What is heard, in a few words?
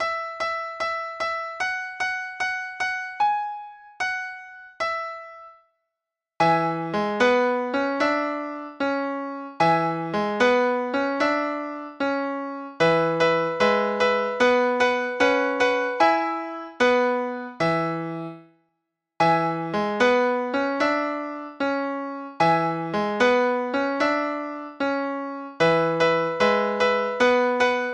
blues hot cross buns on E